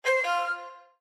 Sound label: Windows Hardware Remove; abbottabad compound